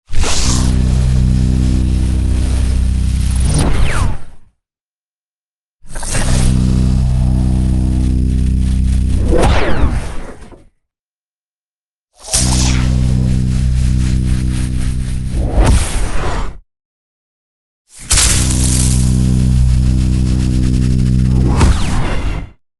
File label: sorcery designed magic electric cast large; sonniss gdc